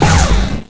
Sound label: Dark Blast Throw; Vaati firing the dark blast